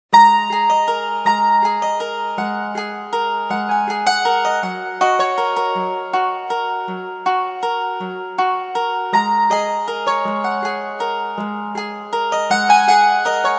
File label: Fields, Ringtone; HTC Freestyle